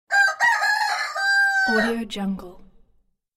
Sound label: rooster crows